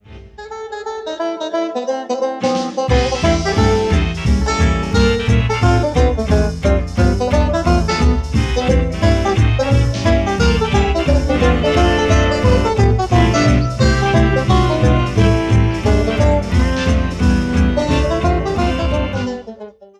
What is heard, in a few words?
banjo, bluegrass